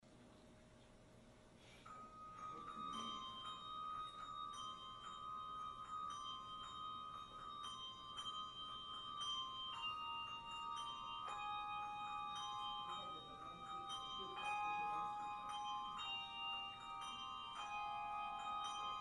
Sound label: Carol of the Bells Bell Choir